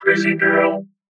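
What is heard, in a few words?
Crazy girl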